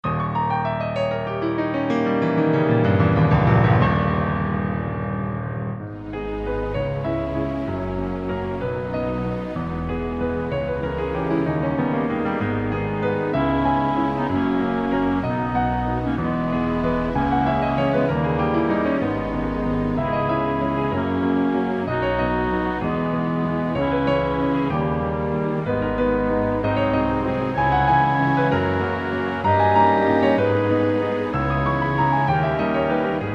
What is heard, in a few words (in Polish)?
Walc gis moll